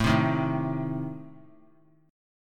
A Diminished A down; Adim strummed; JGuitar

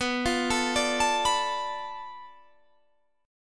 B Minor 7th Flat 5th, Guitar, Bm7b5 chord; Bm7b5 arpeggiated; 19,20,19,19,22,19; JGuitar

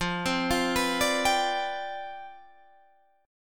C Major 7th Suspended 2nd Suspended 4th F, chord

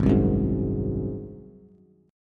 G sharp 7th, Bass; G#7; strummed; 4,3,4,1; JGuitar

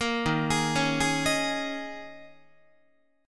Eb 7th Suspended 4th Eb, Guitar

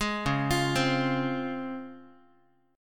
C sharp Major 7th, C sharp, Guitar; arpeggiated; 11,9,8,10,x,x

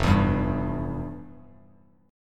F Major 7th Suspended 2nd, Bass Standard; strummed; JGuitar

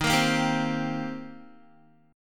Eb 6th Eb down Guitar; strummed

